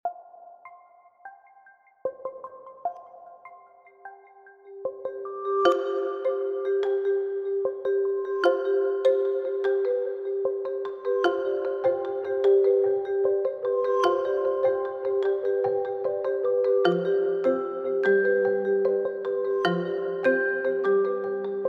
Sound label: Mallets